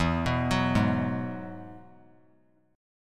E Major Add 9th; arpeggiated; JGuitar

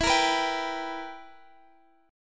B Major 7th Suspended 4th Sharp 5th E

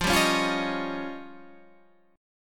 F 7th Suspended 2nd Sharp 5th

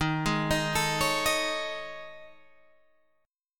A Major 7th Flat 5th

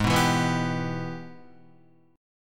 Ab Minor Major 7th Sharp 5th, down, Guitar; strummed; JGuitar

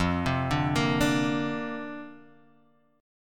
F 6th Suspended 4th F, chord; F64 arpeggiated

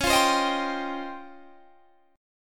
C sharp Major Sharp 11th, Guitar Standard; C#M#11; 21,20,22,20,20,20; strummed; capo=2

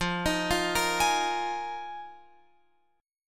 F Minor 11th Guitar Open F; arpeggiated

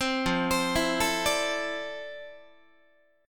F Major 13th Guitar; arpeggiated

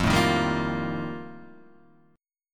F 11th, Guitar, DADGAD

